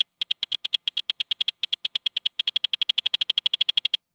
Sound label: counter; fertig classic games; Master of Vega